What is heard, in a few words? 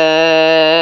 fly; midori; Android Games: Midori no Tabi